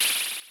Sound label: Open Hat